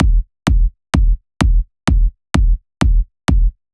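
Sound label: Drum Loop 128BPM bass drum; VEC5 Drumloops; VEC5 Multi Loops 128BPM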